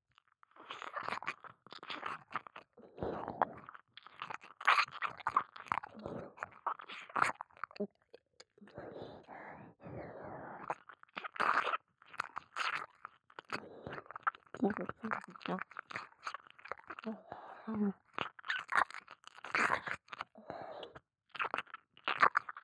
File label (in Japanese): 右 ぐぼぐぼ耳舐め; ネモナ